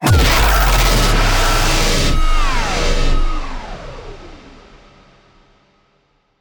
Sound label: Low Cinematic Impact